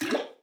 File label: paint trickle; Portal 2